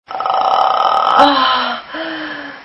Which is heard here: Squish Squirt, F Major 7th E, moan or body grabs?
moan